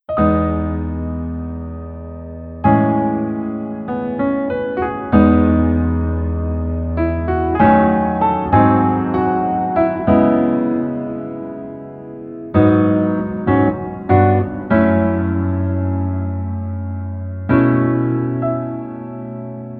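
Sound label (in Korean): Piano version 멜로디 MR -1키; 슬기로운 의사생활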